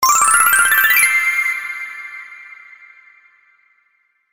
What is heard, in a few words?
Completed Coins Victory; Justin Danger